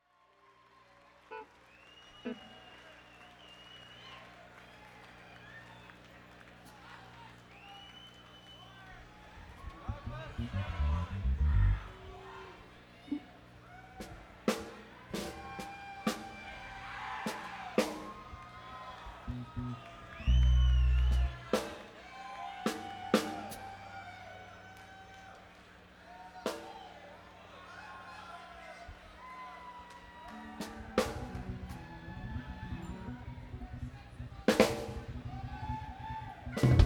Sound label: Tuning